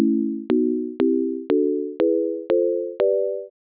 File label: Chords